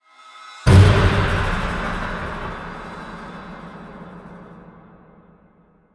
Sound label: Stinger; the walking dead survival instinct gamerip